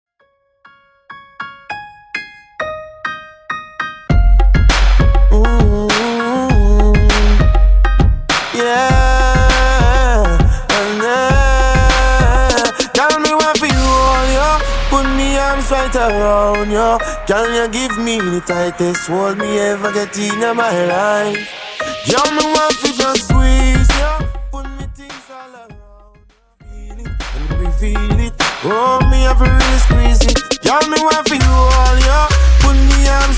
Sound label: Club Ready Remix